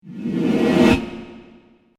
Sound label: Disappearing Swoosh; Andrew Fleming